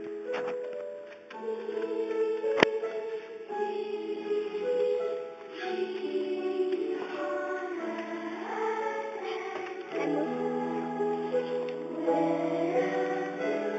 choir

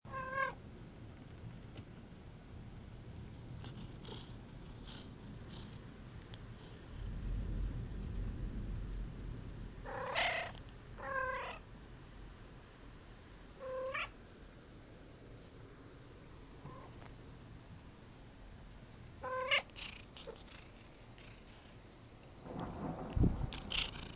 cat in a thunderstorm

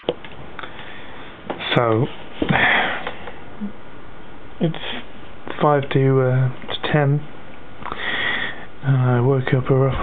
train whistles

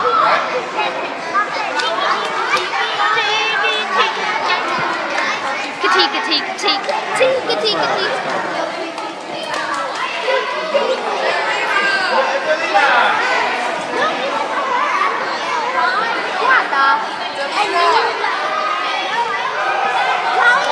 gymnasium at pickup

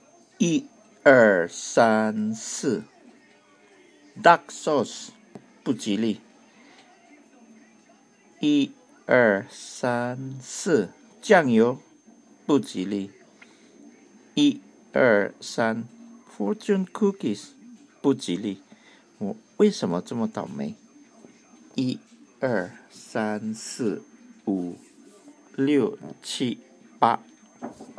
counting song mandarin

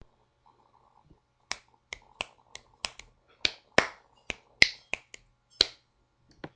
clicking testing

